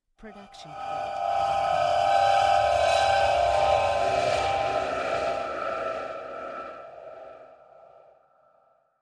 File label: high ghost swell; soundscrate